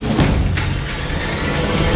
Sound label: stun intro intense